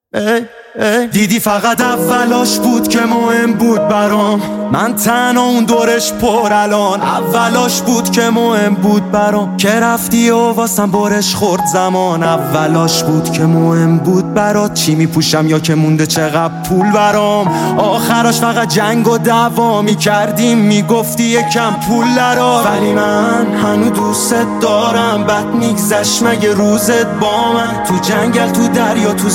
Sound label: Piano Version